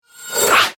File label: elec; efectos